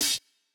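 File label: Jrock 3 open, hihat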